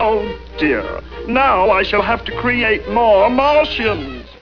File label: death; Alien Arena; marvinmartian